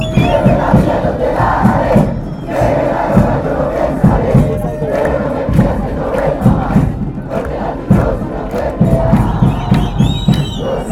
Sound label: santa fe chant